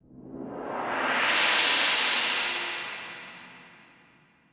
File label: burst, Percussion Swish